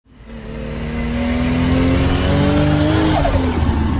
Turbo Boost; Skyline

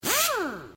Air Impact

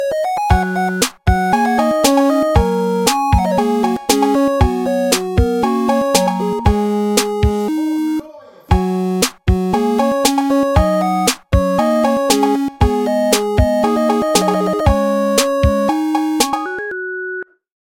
8 bit theme

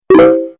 chat

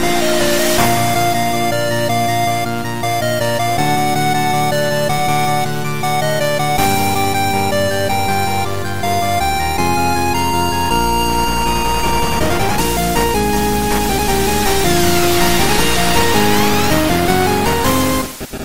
Departure 8bit

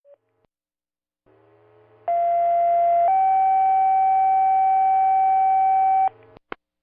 Fire Tone

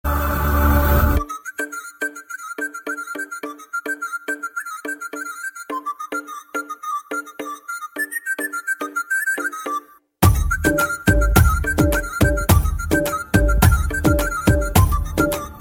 awesome flute

